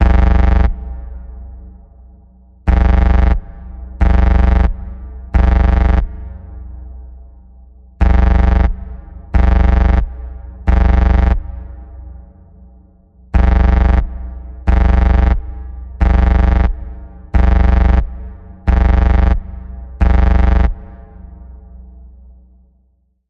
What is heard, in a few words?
Emergency Death Code B, Key of B